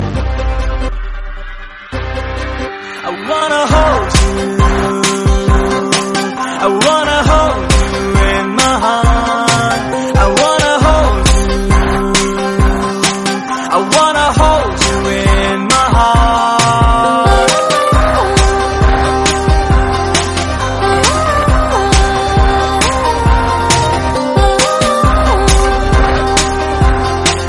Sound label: Telugu ringtone; panja